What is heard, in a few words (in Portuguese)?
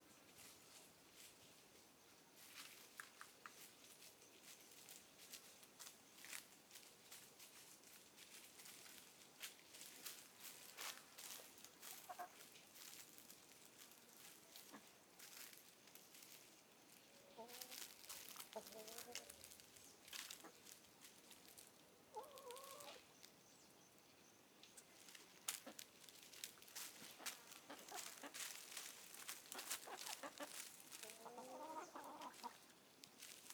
Galinhas cacarejando e andando na grama area externa do galinheiro; aves